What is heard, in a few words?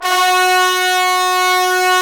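BRS Trombones, Bone Sec.FX; Roland LCDP06 Brass Sections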